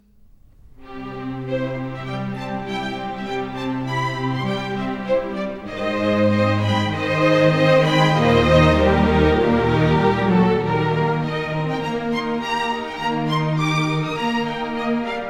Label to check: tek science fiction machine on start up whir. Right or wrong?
Wrong